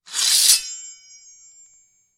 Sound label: SWORD FROM SHEATH; Sound Design - Hard FX - Sword From Sheath